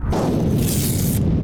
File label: plasma blade cut